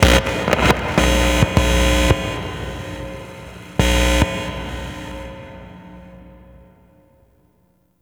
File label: Stage Amp